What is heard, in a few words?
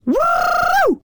Woman Woohoo; voice; Zomboid; Lifestyle